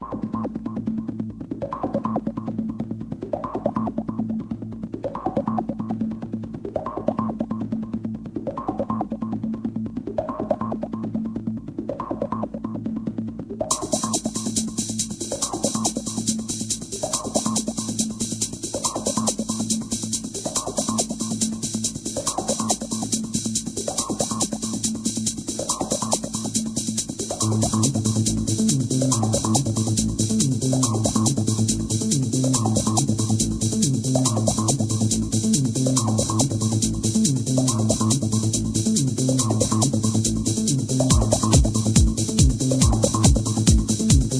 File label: Night Train, Electronica; XBOX; Amped2